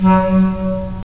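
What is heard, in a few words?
wind clarinet; LA Composer vol. 3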